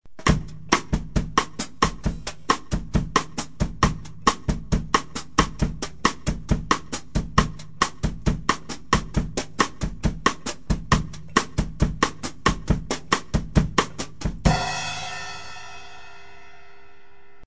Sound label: bossa